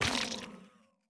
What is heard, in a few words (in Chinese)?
幽匿破坏声